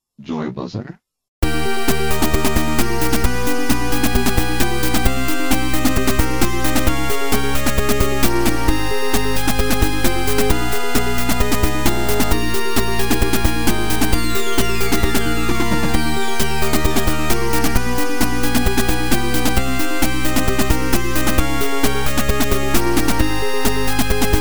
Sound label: 8 bit mix